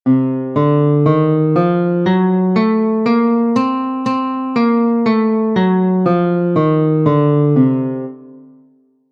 blues chord

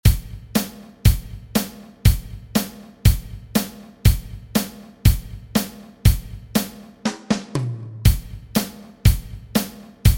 fill in

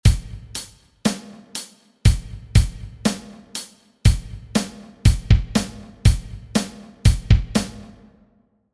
double time

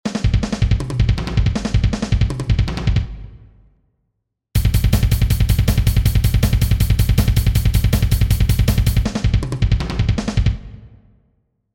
drum pattern metal

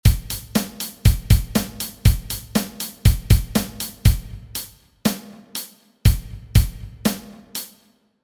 half-time

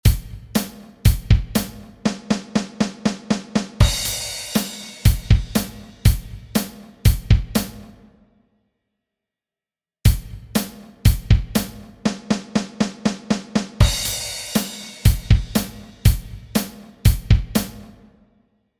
syncopation

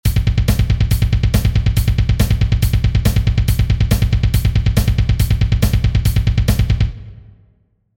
drum pattern metal

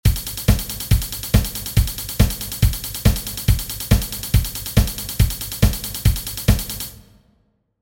drum pattern pops

